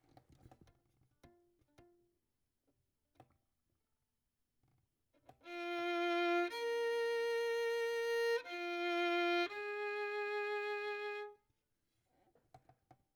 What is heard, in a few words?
Violin; Verdigris Music